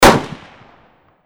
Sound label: 44 caliber handgun